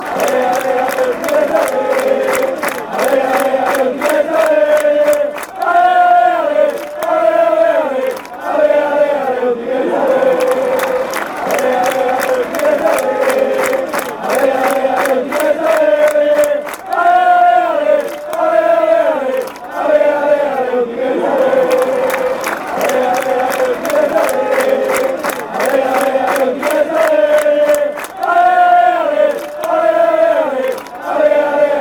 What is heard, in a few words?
ale, fan chants